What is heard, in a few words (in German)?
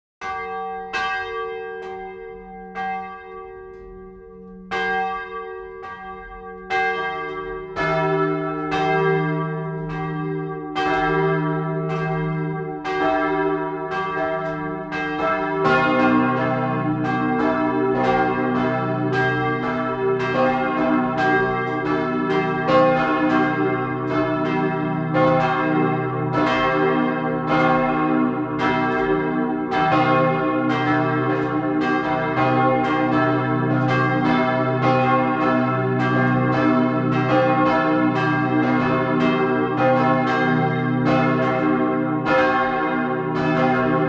Glocken - Volles Geläut; Gütersloher